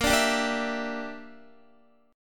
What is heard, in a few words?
A sharp 6th Flat 5th chord, Guitar; strummed; x,13,14,12,14,12; JGuitar